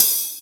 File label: Hihat Open